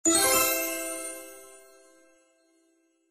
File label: roulette up